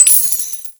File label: Coin Spawn Small